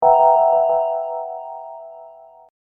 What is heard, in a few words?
Hip Hop Dark Rhodes Licks 90 Bpm Straight E; Keyboard Loops; Lynne Music